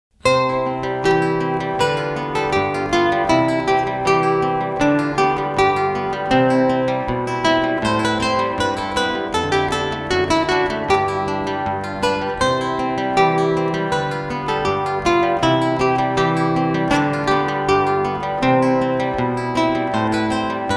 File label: praeludium, 2 Gitarren; klassisch